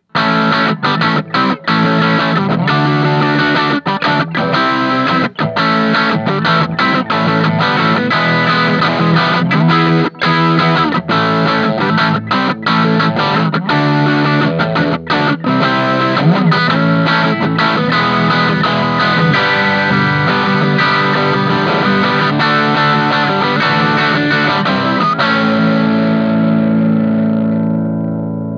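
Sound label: crunch middle, Crunchy Tones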